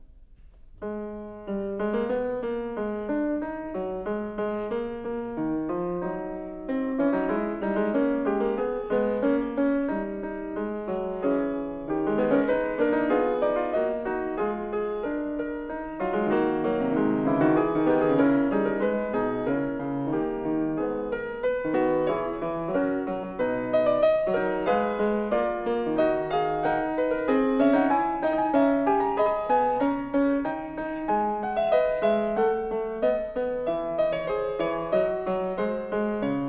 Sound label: Fugue No. 18 in G-sharp minor, Bach, Well-Tempered Clavier; BWV863